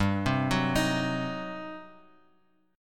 G Major 7th Suspended 4th Sharp 5th, G, down, Bass Standard; GM7sus4#5 arpeggiated